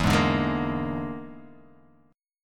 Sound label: E 7th Flat 5th, E7b5 chord; strummed